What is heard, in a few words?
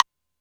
Md Foley, Alesis D4 drum machine